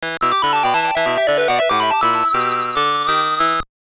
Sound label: Jingle; Shin Nippon Pro Wrestling Toukon Retsuden; WonderSwan